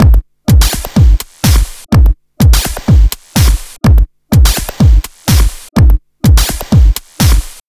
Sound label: Zippel Beat, dance